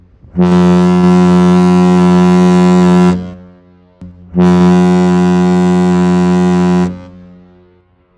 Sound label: Stena Estrid horn